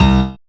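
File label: piano C, House Selection, Dance Synths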